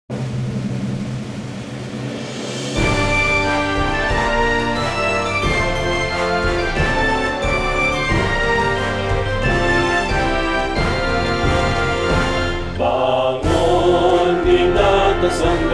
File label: March City Song